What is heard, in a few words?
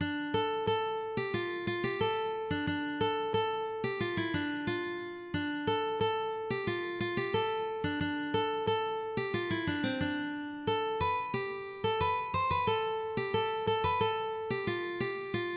cantiga, ukulele